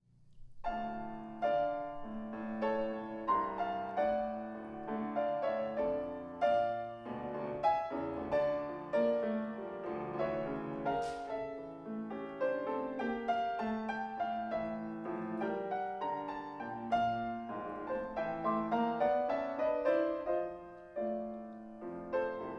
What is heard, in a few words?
tiny waltz in four; newcentury